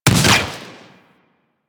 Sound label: Projectile Explosion; Enemies; Abilities; Sentient Brachiolyst